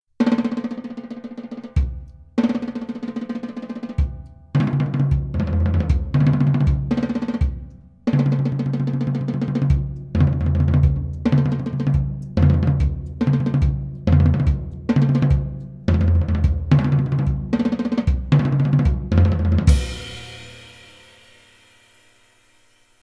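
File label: drum kit tom drums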